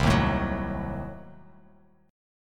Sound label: C Diminished 7th down Bass Standard; strummed; JGuitar